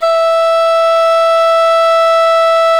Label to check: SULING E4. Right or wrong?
Right